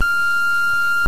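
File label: kissoff, Ademco ContactID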